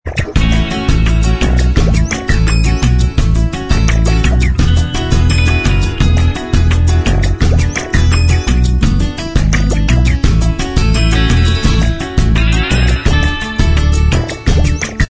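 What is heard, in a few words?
instrumental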